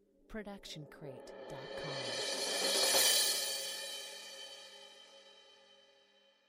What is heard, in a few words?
crash cymbal 16 inch swell mallets medium; soundscrate